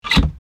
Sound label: Blind lock mechanism unlocking; blinds; gfxsounds